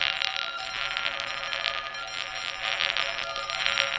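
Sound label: Marble Stone Roll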